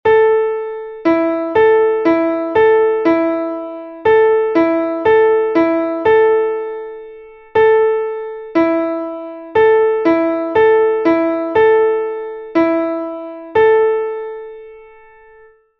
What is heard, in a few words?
perfect fourth; Essentials of Music Theory: Elementary